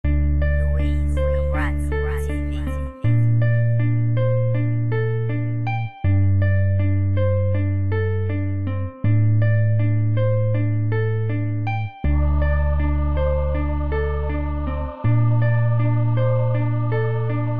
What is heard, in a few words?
English Instrumental